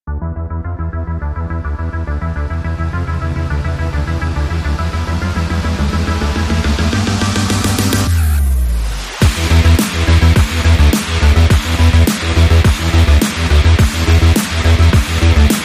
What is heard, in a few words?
Sport electro